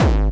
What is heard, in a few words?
BD Distortion, Bassdrums; Vengeance Samples